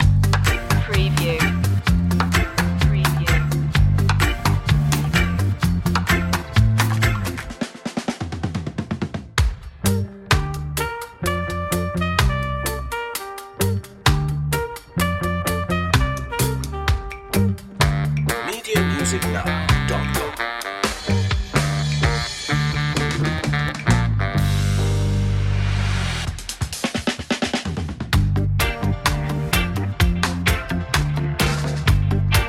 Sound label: easy reggae vibe; 60secs